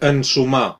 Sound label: sniff; gcompris